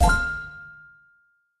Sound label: ui continue; netent; copycats